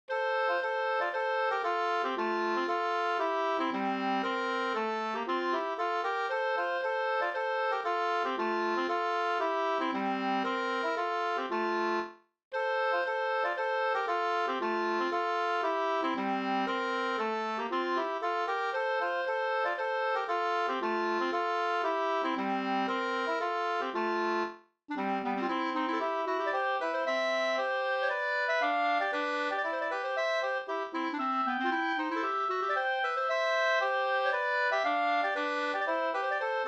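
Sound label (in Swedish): polska, tvåstämmig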